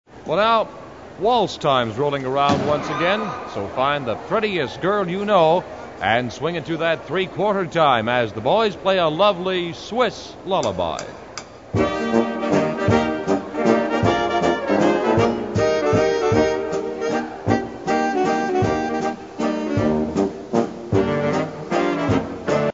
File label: SWISS LULLABY WALTZ